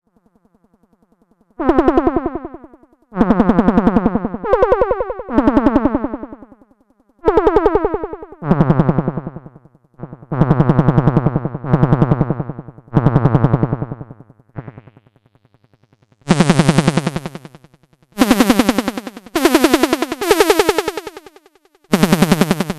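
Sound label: Various, Minisonic2 Analogue Sound Synthesizer; G.D.Shaw's Minisonic 2 synthesizer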